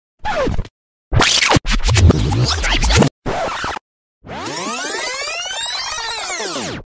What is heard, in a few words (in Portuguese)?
rebobinando fita; efeito sonoro